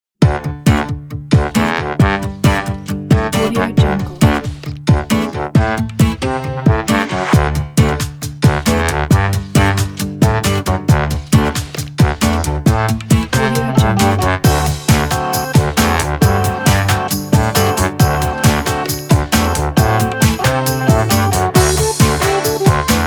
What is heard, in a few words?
funky heart